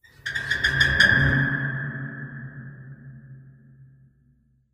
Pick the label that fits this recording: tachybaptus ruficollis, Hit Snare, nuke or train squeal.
train squeal